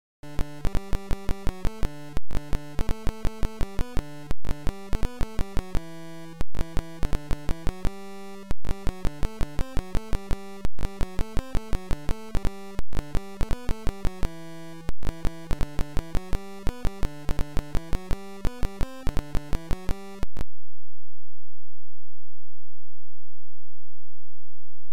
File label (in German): Banjo Melodien